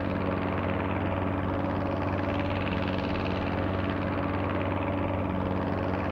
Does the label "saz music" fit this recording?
No